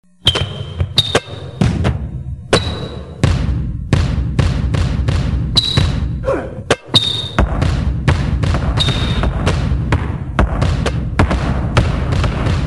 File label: basketball beat; ringtones